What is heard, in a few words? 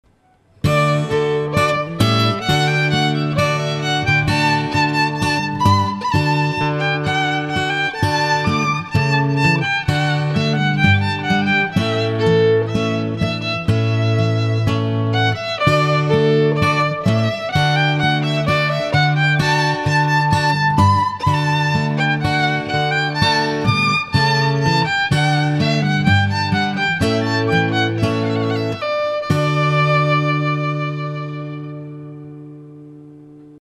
violin; rondau